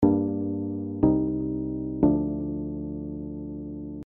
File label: G Minor C Minor and D Minor